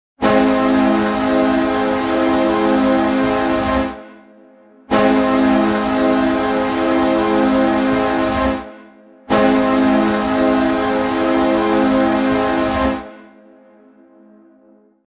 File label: original new york islanders goal horn